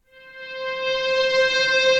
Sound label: Distant String; Instruments; Distressed Strings; Ableton; Mood Reel